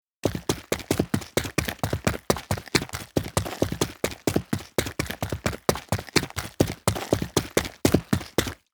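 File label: run normal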